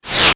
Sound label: Boss Sand; Boss popping out of the sand (Lanmola) (2 sounds heard together; LTTP